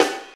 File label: low snare sound g key, snare sample tuned to the G note; snare drum samples